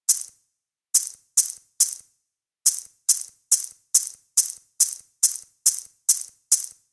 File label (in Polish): marakasy rytm; Nagrania Lokomotywy